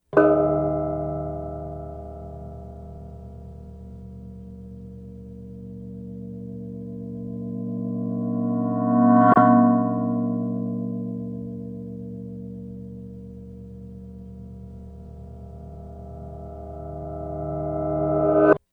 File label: gamelan mix